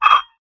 household glass bowls x2 stacked turn scrape squeak; zapsplat